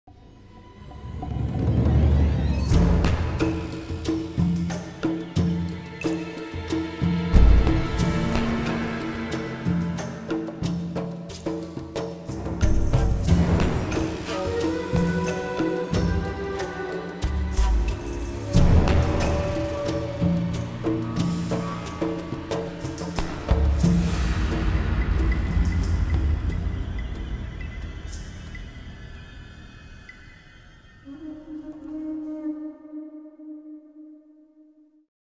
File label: Industrial Jungle Ambient